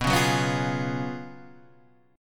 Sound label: Gb Major 7th Suspended 2nd Suspended 4th